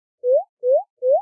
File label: Sleep